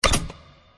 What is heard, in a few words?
Ui Confirm